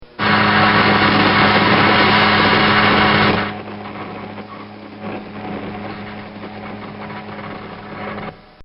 Electric Chair; recorded in a custom built sound booth

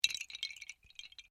Windchimes Bamboo Small Short; Various windchimes; Musical

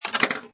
Pinball Sound Rattle And Clank; Video Games

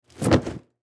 Trash Can Remove, Trash Can Remove Plastic Lid; Household; Garbage

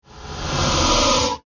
Whoosh Reverse, Suction, Accent, Transition; Production Element, Imaging Element; BlastWave

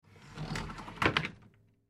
Pantry Door Open, Pantry Door Open Slide Track; Cabinet Doors; Household; Blastwave

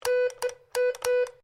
Morse Code Y; Morse code aplphabete; Electronics

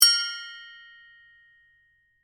Metal Ting Ring, Foley, Metal, Ting, Ring; Materials

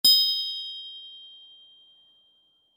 Musical Accent, Musical Accent Glockenspiel Chime; Mallet Sound Effects; Cartoon

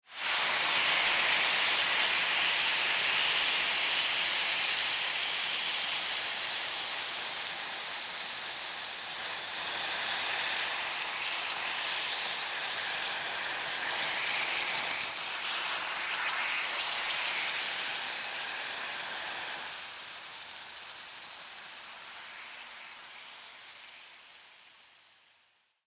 Household Sizzle Frying Pan Long; Kitchen

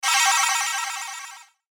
BUTTON SHIMMER WARBLE; Multimedia; Blastwave

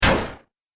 Laser Musket Shot; Laser Gun Sound Effects

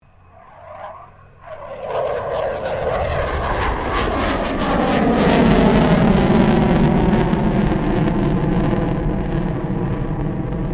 FA-18 Hornet pass; RAAF FA-18 Hornet; Airplanes (Sound Effects:Vehicles)

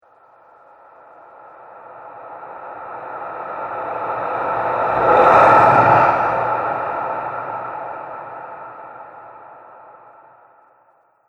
Shadow Spirit, ghost; Ghost Sound Effects; horror sound effects

